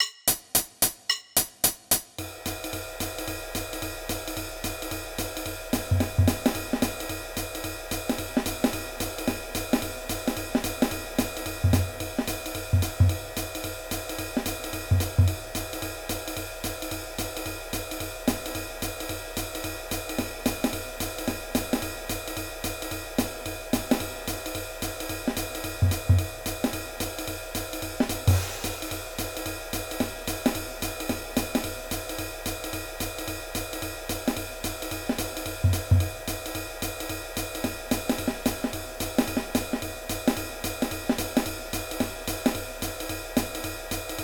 Backing; jazz walking basslines; ragajunglism

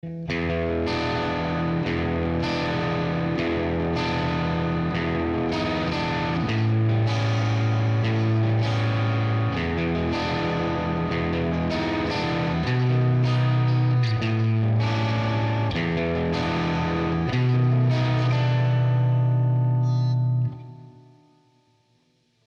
12 Bar; ragajunglism

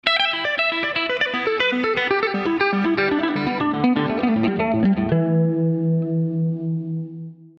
Pentatonics Flurries; open string awareness; ragajunglism

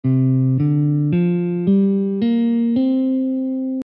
hungarian persian gypsy; global scales

